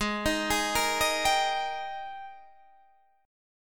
Ab Major 7th Suspended 2nd Ab down Guitar Standard; arpeggiated; JGuitar